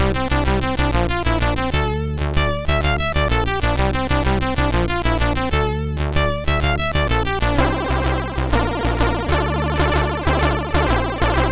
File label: Celebration Theme, sid MOS6581R4